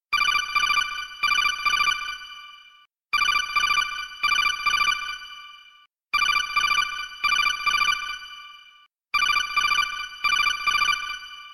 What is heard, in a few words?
Codec; Metal Gear